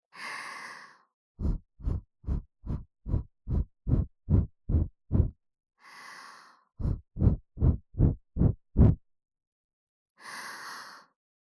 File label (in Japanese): 【左】耳ふー, 耳かき, ASMR